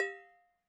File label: tin can drip